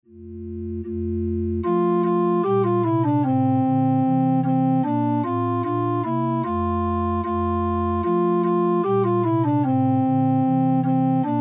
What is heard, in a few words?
Ding Dong; Ding, Dong, Merrily on High; christmas; festival choir; Llanddewi